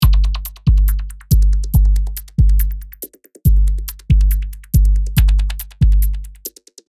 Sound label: E Drum minimal beat loop